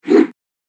Broadsword Cutting Air; Sword Sound Effects; Fantasy Sound Effect

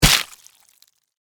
Impact Skull, Horror, Blood, Flesh; Blood and Guts; BlastWave

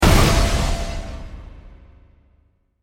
Hit Distortion; Production Element; Distortion Sound Effects; Distortion (Sound Effects:Sound Design); SFX Bible 6

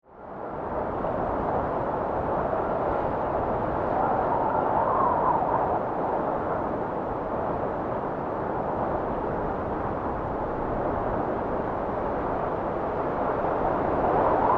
Wind Storm Constant, Weather; BlastWave

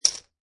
Poker Chips Dropping On Poker Chips; Poker, gambling, chip: Poker chips dropping on poker chips, clicking; Lynne Music

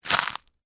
Texture, Crunch, Oyster, Crackers

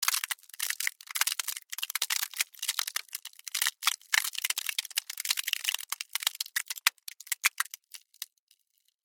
Ice Crack Long, Scifi; Science, Fiction

